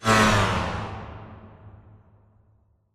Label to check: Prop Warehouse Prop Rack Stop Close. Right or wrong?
Right